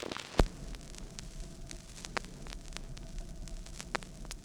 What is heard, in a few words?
Crackle, Vinyl Noise